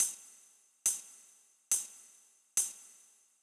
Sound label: Beat - Tambs, Grime, 140bpm; Zero-G Sound Sense Grime Scene; GS Construction Kits; Just Flex